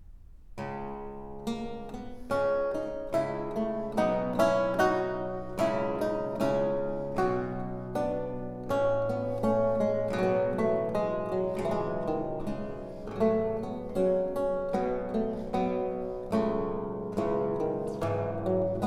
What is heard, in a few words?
Fuga, Lute Music